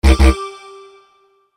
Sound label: negative sound